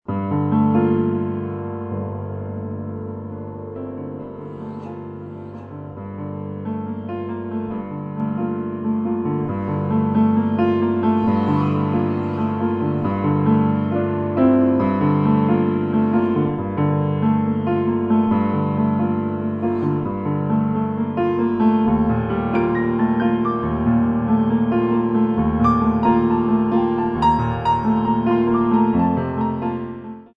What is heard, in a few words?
classical fantasy in g